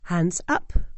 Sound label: hands